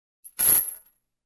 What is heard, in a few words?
Glass Tinkle